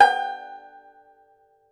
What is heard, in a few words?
TENOR BAN, Tenor Banjo; E-MU Producer Series Vol. 7 – Old World Instruments